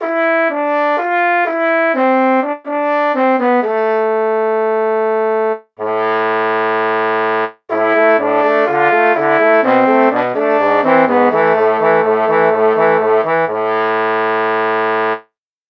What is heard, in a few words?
trombone